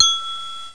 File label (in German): Sound Ton